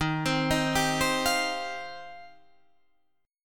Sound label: Eb 6th Add 9th, Guitar, Open D